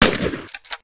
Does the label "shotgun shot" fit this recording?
Yes